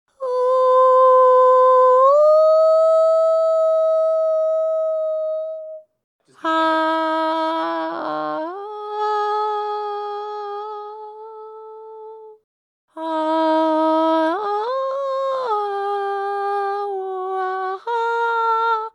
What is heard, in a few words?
NPC female old sing; Hollow Knight: Silksong